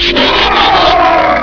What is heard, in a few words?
trans down; grimlock